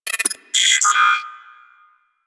Computer Beeps; Warframe; Guild

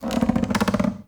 Cave Ocean Dock Creak; Ambience; Midrath Island

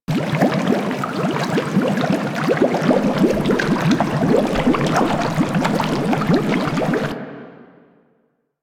bubbling water loop; Ambience; Props; Loops; Warframe; Eidolon Plains

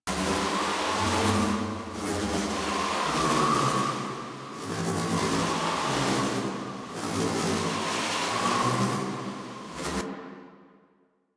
Song Bubble Loop; Enemies; Abilities; Warframe; Teralyst; Bigalyst

